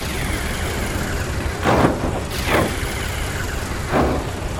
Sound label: retch idle, strogg; Quake 4 Alpha